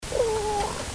Burping salmon; soniferous

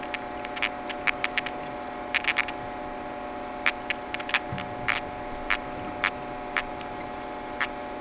Ossancora punctata, Amazon, soniferous

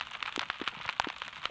popper, soniferous; Gilligans Island

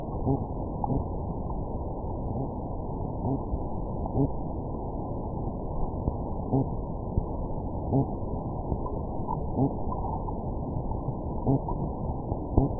Serrasalmus maculatus, Amazon, soniferous

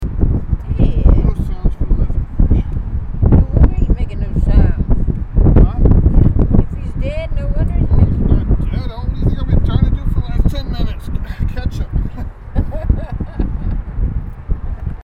Mom laughing; Carolina fishes

